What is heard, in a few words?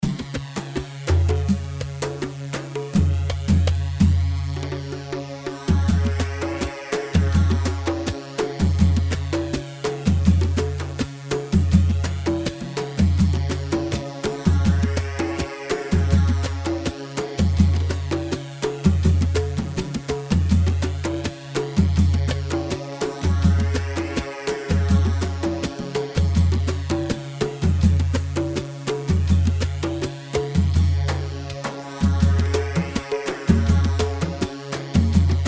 Bandari 4/4 82; بندري